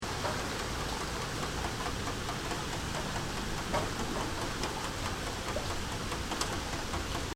Raindrops Constantly Falling On A Metal Window Sill; Crafted Signals